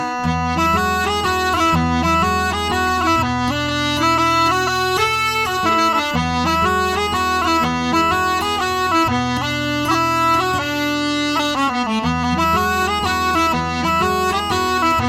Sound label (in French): Mazurka